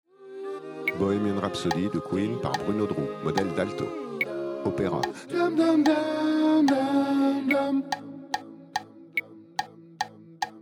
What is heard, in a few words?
Alto Opera